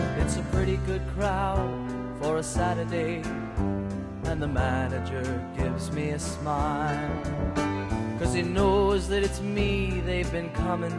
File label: Piano